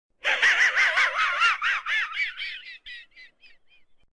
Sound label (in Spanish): risa